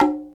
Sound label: xylo pong; sgi